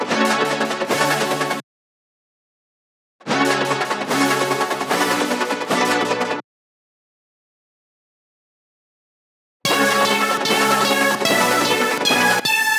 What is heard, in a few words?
150 kit loop synth main chords dry Dm; skreem